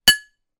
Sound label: impacts glass chink metal object strike